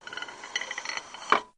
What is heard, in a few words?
Tripod Leg Telescop